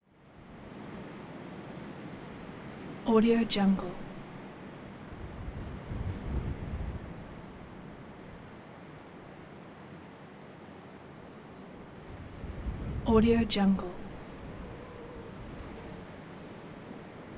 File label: wind backyard santa anna storm 2am